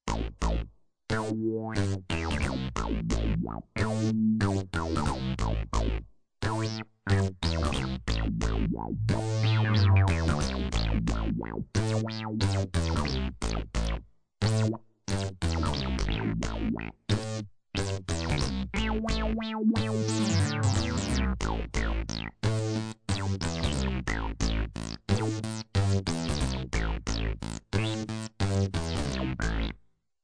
Analog Sweep bass; Surround Session

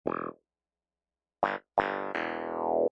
Wah Clav; Funk & Rock; Loopology; Get Dirty

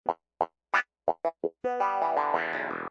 Wah Clav; Funk & Rock; Loopology; Get Dirty

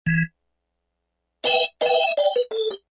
Swish Organ; Funk & Rock; Loopology; Get Dirty

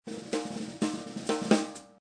bebop drum 250 BPM; Lounge & Reggae; Loopology; Be Bop Baby

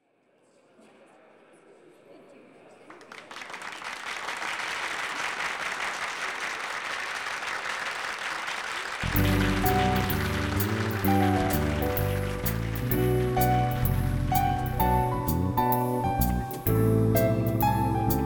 Martini Lounge 6 Channel; WMA Surround Mixes

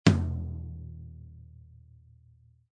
Stereo Floor Tom; Loopology

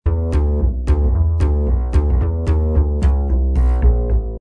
Bow Fidl E, Rockabilly

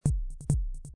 Pulse, Techno & Dance; Loopology; Pumpin

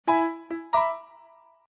Cool Swing Piano E; Lounge & Reggae; Loopology; Be Bop Baby

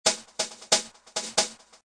Little Snares; Loopology; Pumpin; Techno & Dance

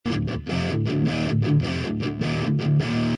Brit Stack Drop D; Loopology

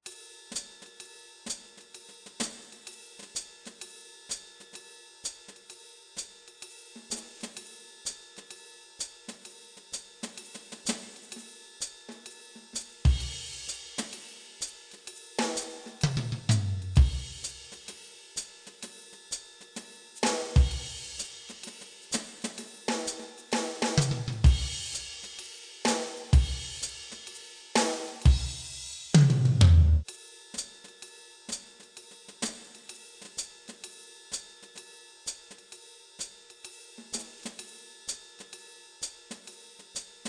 Drums & Fills, Martini Lounge; Surround Session

